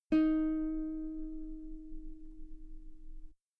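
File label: guitar D sharp 5 very long forte normal; bananenkeyboard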